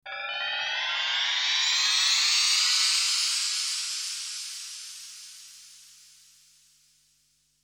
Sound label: bell rise